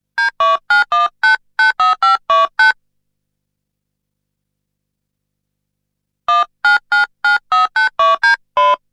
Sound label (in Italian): Composizione numero di telefono; phone; 2 tipi